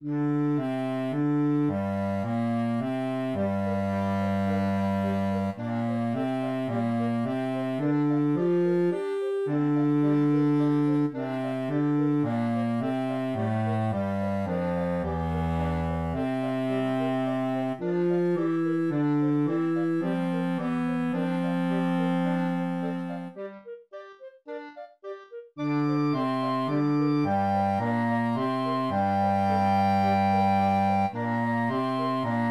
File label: Chant Ostinato, clarinet choir